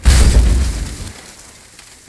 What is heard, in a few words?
die; alicel